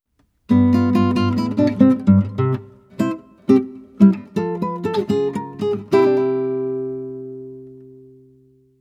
Power Down, Game Over, guitar; Super Mario Bros.; super mario series for guitar 2010

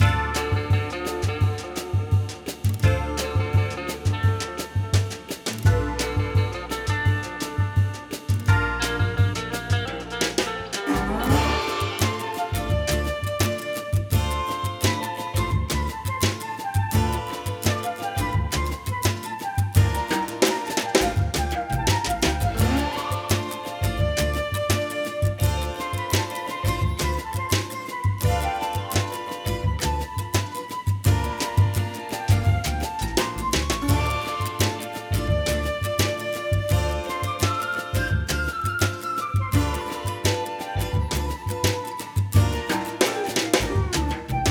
Rainforest; Ty the Tasmanian Tiger 4 Bush Rescue Returns

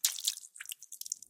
Blood Splut

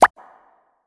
Bubble Pop

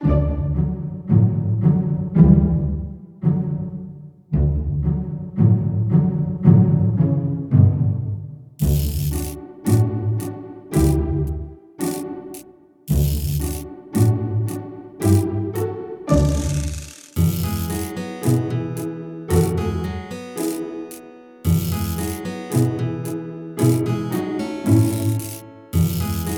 MUSIC SOFT

